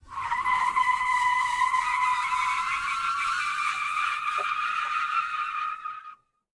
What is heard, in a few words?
skid asphalt; Blind Drive